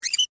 Metal Hinge Squeak, Foley, Metal, Hinge, Squeak; Latch Sound Effects; Latches (Sound Effects:Objects)

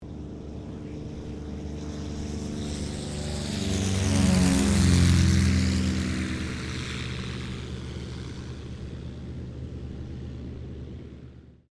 AIRCRAFT CT4A TAKEOFF; CT4 military trainer aircraft; Airplanes

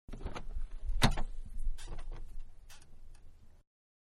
Car Big-Door Open-Exterior; Cars; Vehicles; Manhattan Production Music

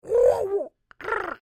Creature Oodle Bird Speak Phrase Short; Animals; Big Room Sound

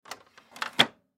Printer Copy Machine Close Door; Office; Printers; Blastwave

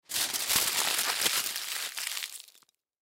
Tin Foil Crumple, Tin Foil Crumple Piece; Household; Foley; Metal; Sound Effects:Materials

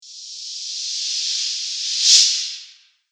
Thin Reverse Whoosh; Whoosh Sound Effects

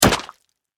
Horror Guts Spatter Blood; Injuries (Sound Effects:Combat)

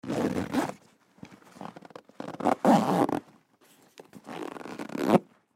Duffle Bag Zipper, Duffle Bag Zipper Zip Up Slow; Zippers; Foley; Blastwave

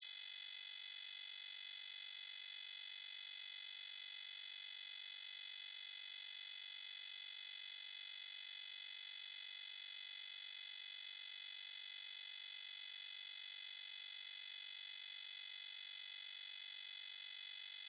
Ballast Buzz Loop, Scifi; Loop, Science, Fiction; Tech; BlastWave

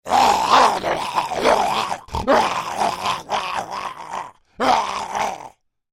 Creature Troll Growls Attack; Monsters; Horror; Big Room Sound

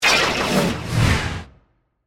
Whoosh Warp, Production, Element, Whoosh, Warp; BlastWave

